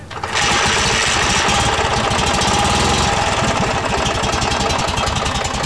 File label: Zundap